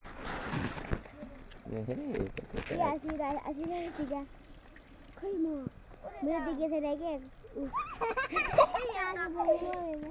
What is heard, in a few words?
kids play in tal, nepal